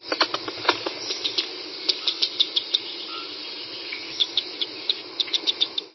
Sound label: the sound of a squirrel chewing on something; veverky obecne